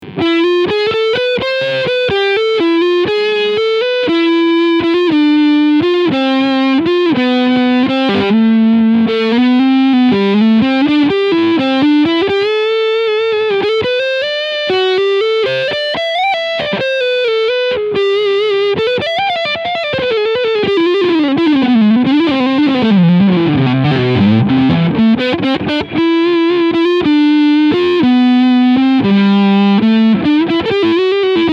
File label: Overdrive Front PU